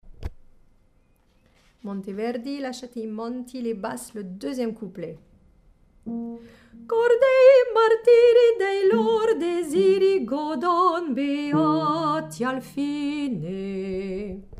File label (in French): Basse